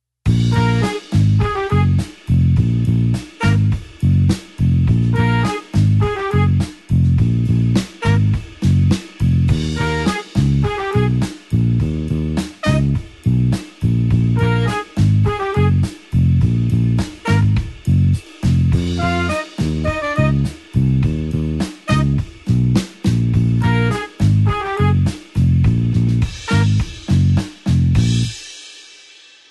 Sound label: blues horns 8beat Bb